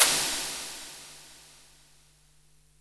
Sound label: Boss DR-660 Snare, Drum Machines